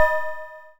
Cymbal Hi Ping, Modular; Wiard 300 Series

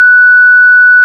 ORG VIP Flt 8' F#5, Farfisa; Keyboards of The 60's and 70's

